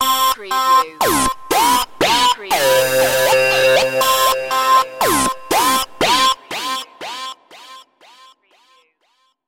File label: Short n Evil